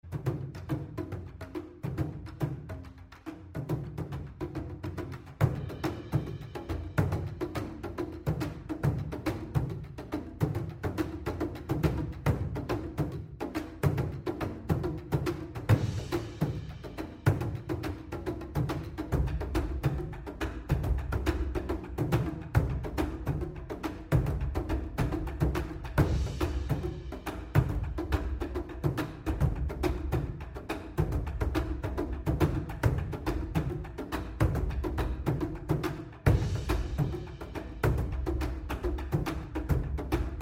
Drum Talk

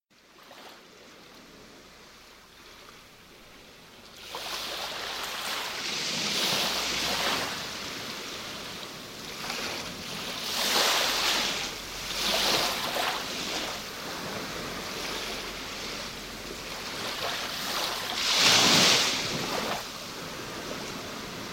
Sea - 3D

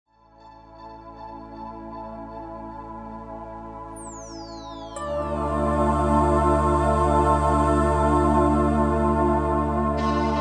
Music for Reiki and Meditation

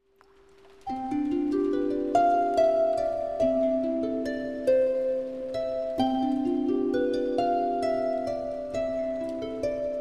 Magical Harp

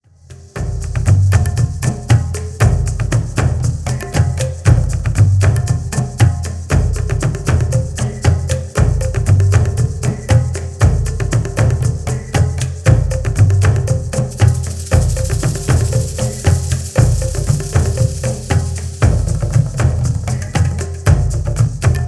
Fire Groove

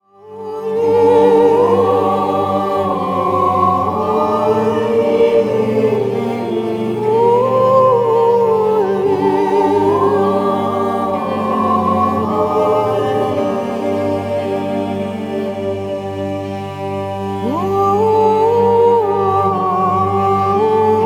Chakra Healing Chants

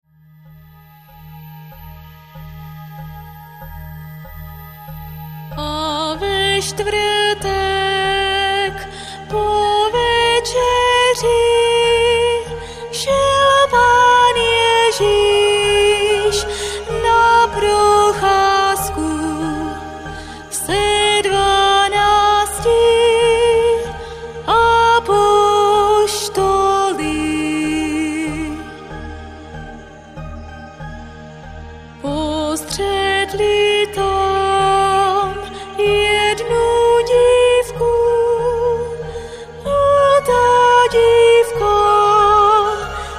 Sacred Voice

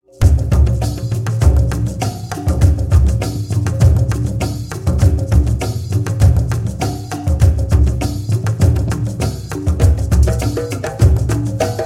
Vision Drum Dance

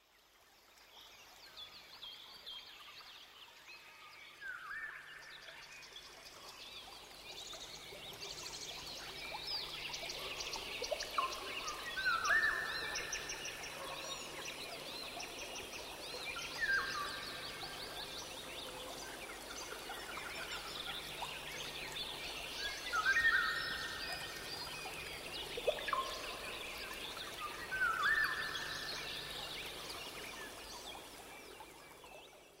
Pure Rainforest

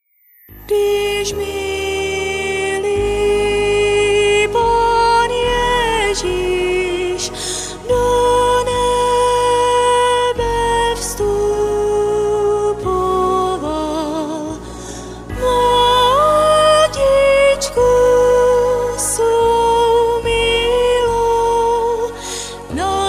Sacred Voice

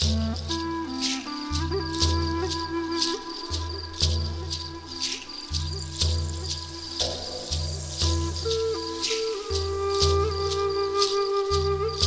Spirit Flute

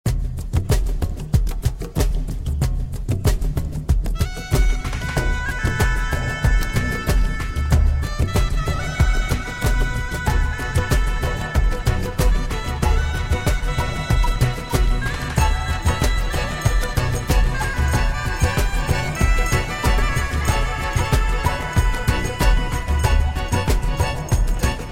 Celtic Tribal Gathering

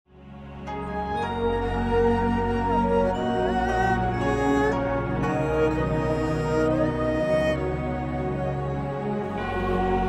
Om Mani Padme Hum

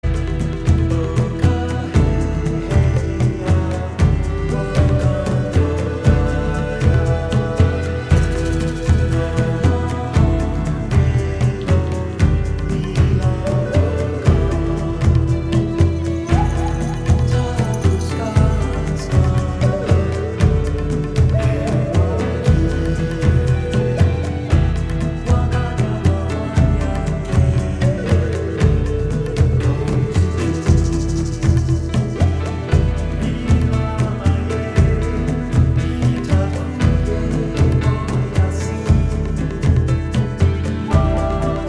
Drum

